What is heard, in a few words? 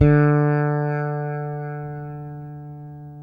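6-string fretless bass; Spectrasonics; Bass Legends; 6-STR FRETLS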